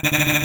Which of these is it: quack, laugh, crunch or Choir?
laugh